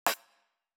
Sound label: ambient